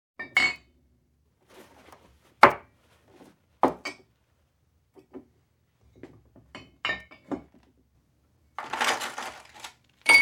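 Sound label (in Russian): возня со стеклянной посудой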